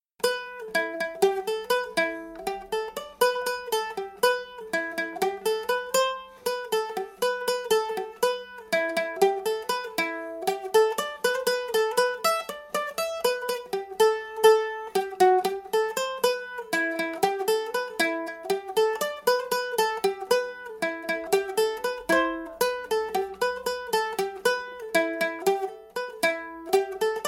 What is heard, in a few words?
banjo